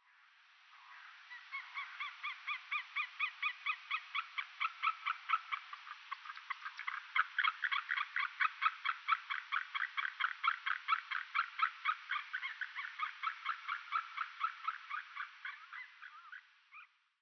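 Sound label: White-tailed Eagle; nemuro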